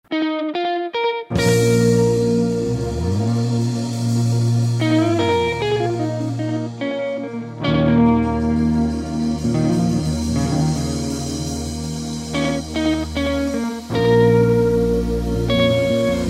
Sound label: Rainy Day Blues